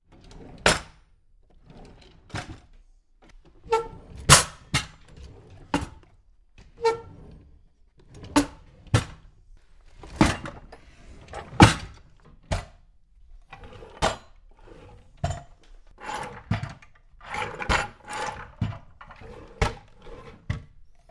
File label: Sliding Draws Various